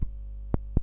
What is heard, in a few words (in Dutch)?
zeepbel